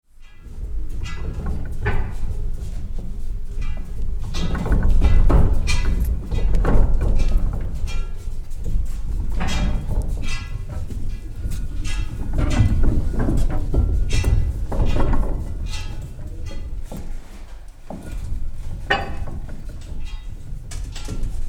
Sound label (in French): broyeuse